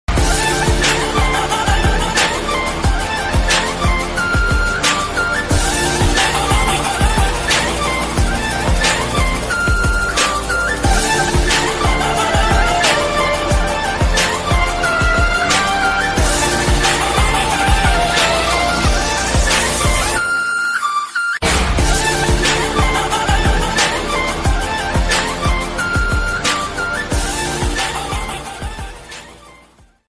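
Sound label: mobile ringtone flute ringtone love romantic background music trending ringtone; Dhruva movie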